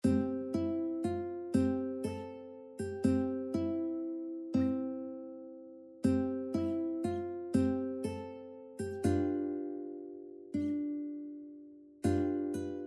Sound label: ukulele; C dur